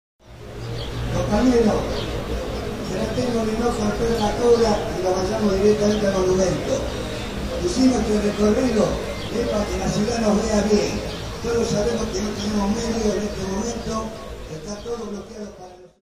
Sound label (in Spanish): manifestaciones taxistas parque norte; Rosario